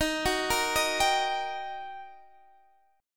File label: G Minor Major 7th Sharp 5th Eb